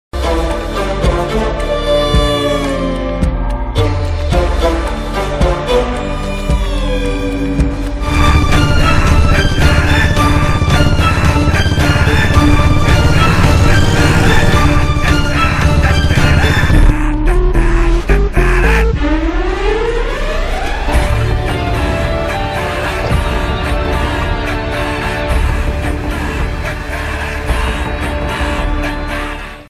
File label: Theme Music Ringtone; Adanga Maru